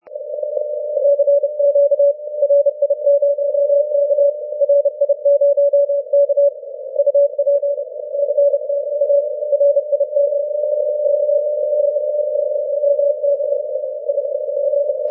20m cw

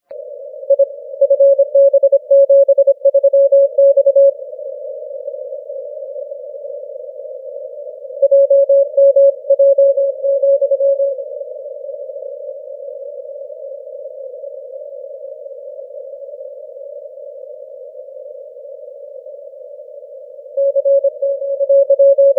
30m cw

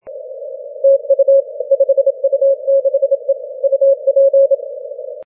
10m cw

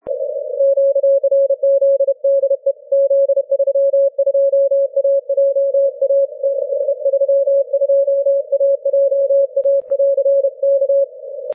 cw; 40m

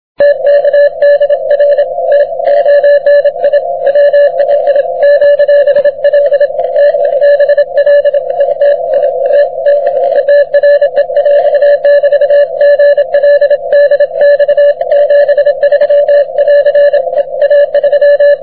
cw; 40m

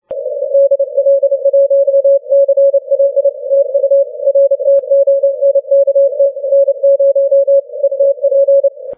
30m cw

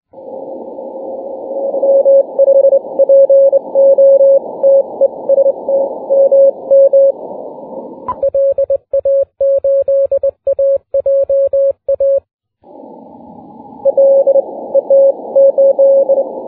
15m cw

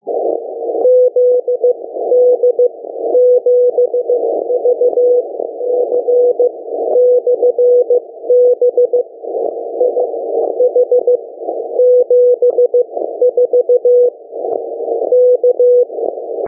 6m cw

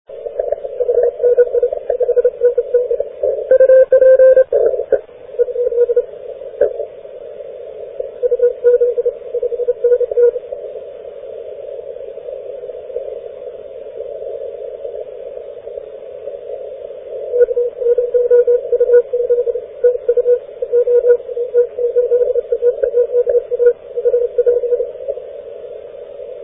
40m cw

